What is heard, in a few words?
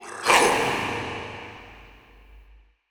Processed Battle Shouts, Viking Vocal